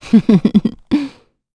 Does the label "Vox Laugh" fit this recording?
Yes